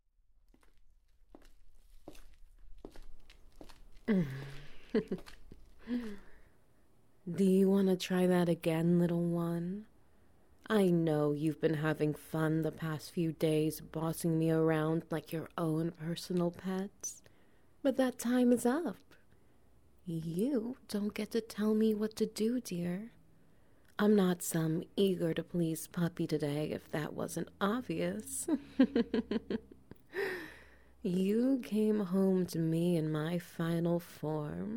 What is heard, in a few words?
Wet Noises; Full Moon